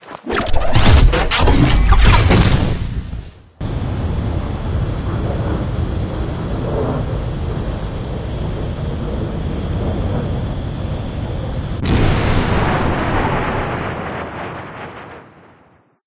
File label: Glider Close